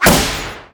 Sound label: Bthrow Metal, Damage